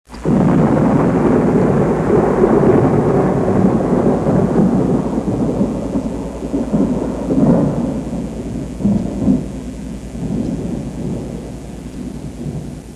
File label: Thunder